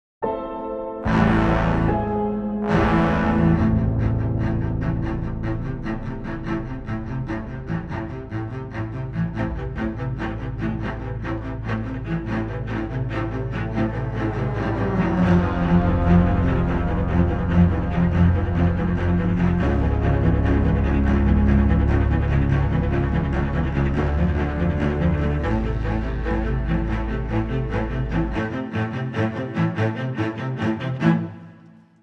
Underscore, Classical Cinematic; Narrative Mind

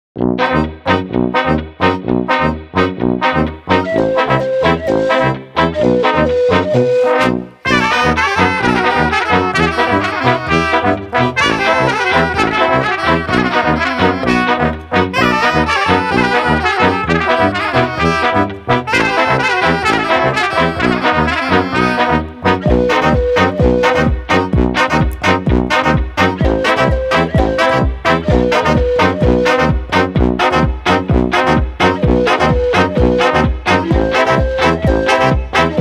Balkan Brass

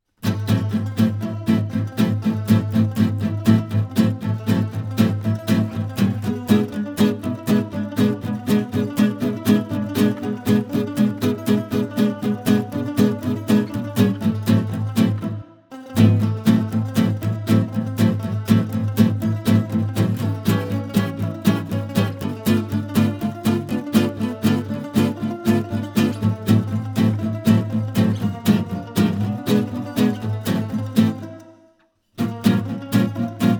Petriarka Underscore, Jazz Manouche

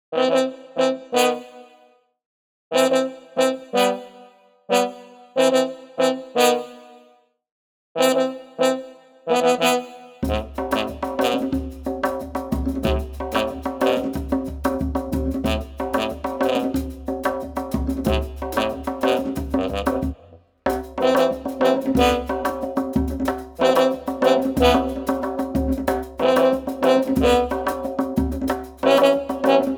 Underscore, Balkan Brass; Narrative Mind

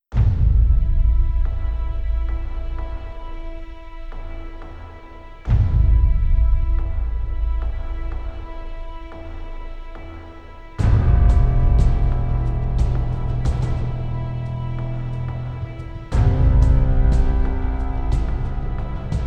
Worlds Enemy Underscore, Drama; Narrative Mind

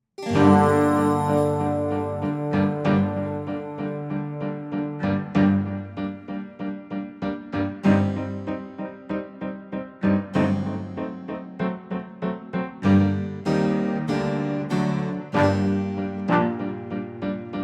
A Perfect Crime Underscore, Classical Cinematic; Narrative Mind

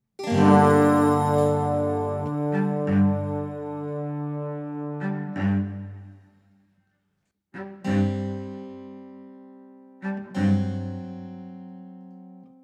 A Perfect Crime Underscore, Classical Cinematic; Narrative Mind

